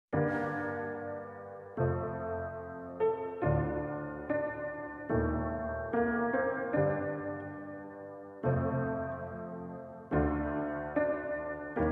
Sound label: speed version